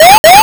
warning

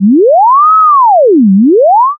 police siren

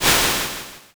swoosh

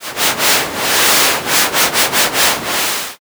river

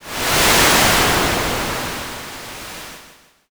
wind

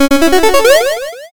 picoquestion

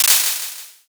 clap

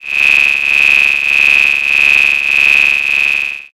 cicada

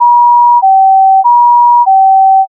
ambulance

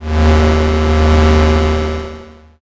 space engine

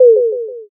m message

